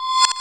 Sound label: REV AGOGO; E-MU Formula 4000 Series Vol. 2; Dance Set 2